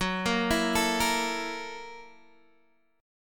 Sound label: F sharp 7th Sharp 9th, Guitar, Open D